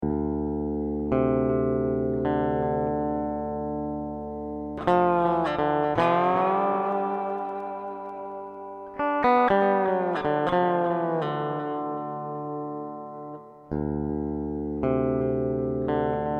SLIDE UP

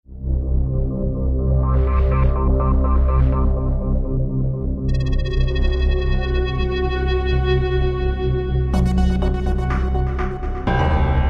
SUSPENSIBLE

